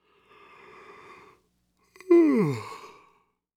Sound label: Human Male Yawn Soft Yawn